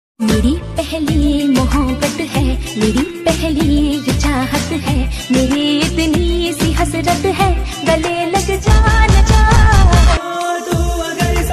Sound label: Female Version Ringtone